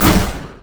arcane barrage; LoL; ironforge xerath

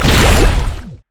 hydroblast; nami base

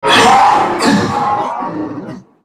dying; CyberNocturne; Character Voices - English

